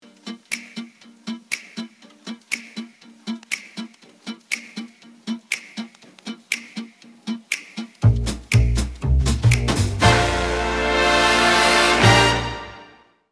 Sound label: music